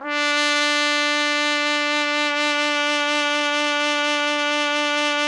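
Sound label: trumpet; Roland - LA Composer vol. 3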